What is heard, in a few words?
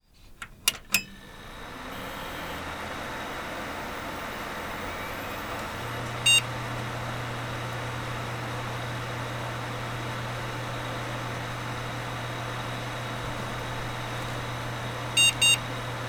computer fan; فن کامپیوتر